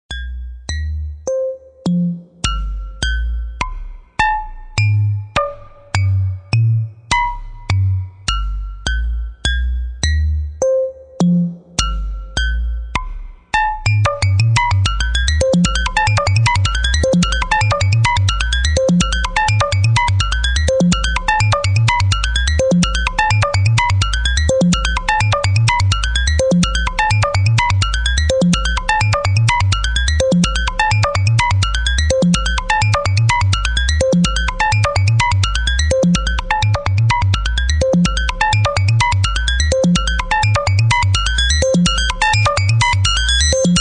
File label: pinger